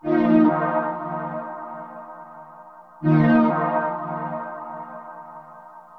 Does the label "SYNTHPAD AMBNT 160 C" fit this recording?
Yes